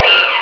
CHIMP

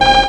ALARM

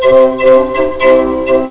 CALLIOPE

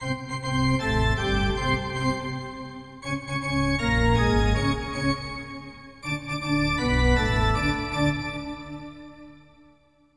ORGAN; RINK

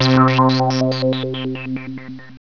MODULAT; HIGHTECH

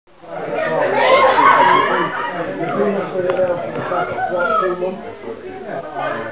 party; ENVIRON

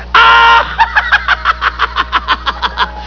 LAUGH

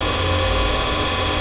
REPAIR

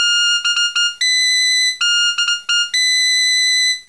kaval

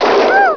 BOOMOOH

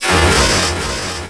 PLAT RISE

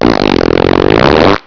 FART

